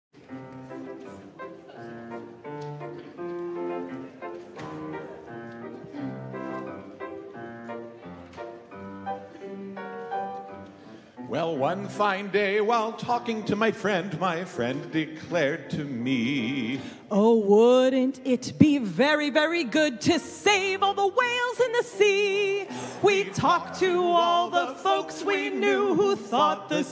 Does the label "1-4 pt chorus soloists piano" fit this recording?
Yes